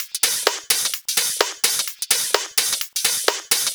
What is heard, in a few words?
House Loop, 128 BPM; Vengeance Samples